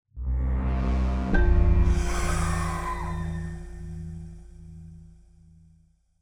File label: toll the dead, ominous bell; cantrips